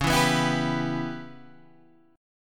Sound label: C sharp Minor 7th Flat 5th, Guitar, Standard; C#m7b5 strummed; 9,7,9,9,8,7; JGuitar